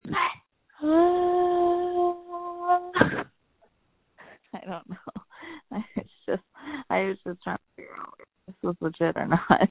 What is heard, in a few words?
Scream